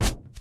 mech armor land grass; Factorio

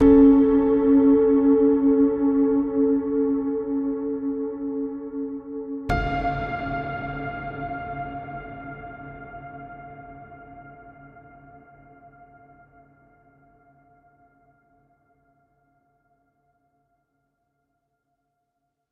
melody a, ambient

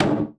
slide stop, electromagnetic plant; Factorio

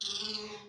insects deep mud; factorio

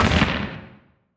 asteroid damage interstellar medium; Factorio

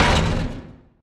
asteroid damage metallic small; Factorio

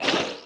wriggler death; enemies; Factorio

